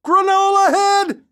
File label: cyclers; donnie; Blind Drive